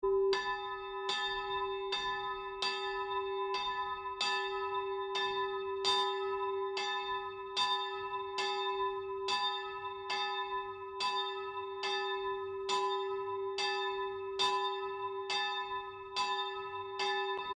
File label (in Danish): Kirkeklokker ringer gudstjenete lellinge kirke; Kroppedal Museum; Alpegården